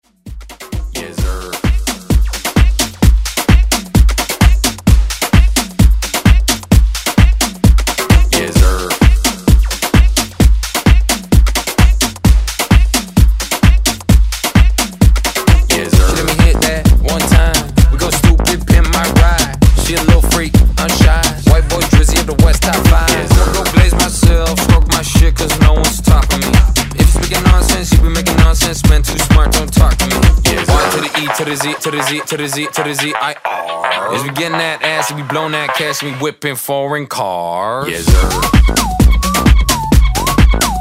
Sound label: Afrobeat; Redrum